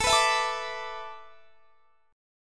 A Suspended 2nd Suspended 4th; Asus2sus4; strummed; x,24,21,21,22,22

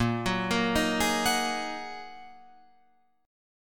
Bb 7th Suspended 4th Sharp 5th Bb Guitar Open F; Bb7sus4#5 arpeggiated; 10,13,10,10,11,13

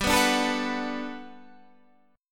G Major 9th, Guitar, Standard

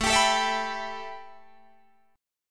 A 7th Suspended 2nd Guitar Drop D

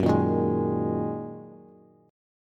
Gb 6th Add 9th Gb down Bass; strummed; Gb6add9; JGuitar

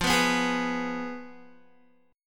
F Diminished, Guitar; strummed; chordshape; 12,14,11,13,11,x

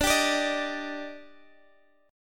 D sharp Major 7th D

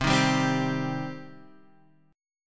Eb 6th C, guitar

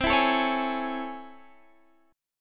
A Major 7th C; strummed; JGuitar

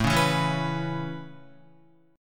A Major Add 9th A Guitar, chord; Aadd9 strummed; JGuitar

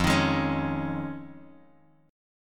F Minor 7th Sharp 5th, Bass Standard; JGuitar

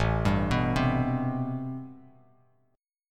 A-Minor 9th A Bass Standard; arpeggiated; JGuitar

